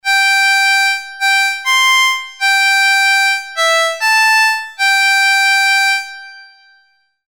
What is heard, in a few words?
Harmonica piece; instruments